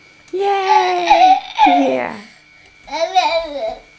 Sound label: laugh